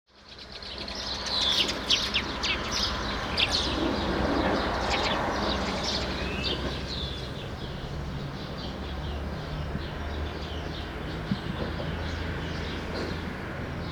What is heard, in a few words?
True sparrows